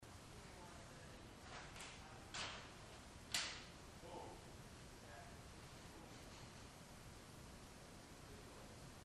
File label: atrium something falling; heaven city